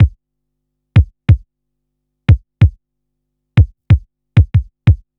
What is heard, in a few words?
BEAT, Roots Funk; USB Soundscan vol.41; AKAI